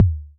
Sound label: Kicks, Elektron MachineDrum; SPS1 MKII